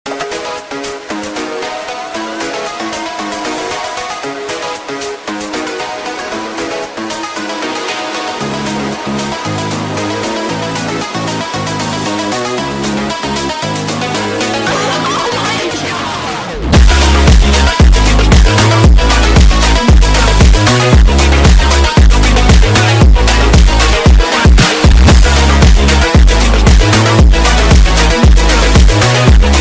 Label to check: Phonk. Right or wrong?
Right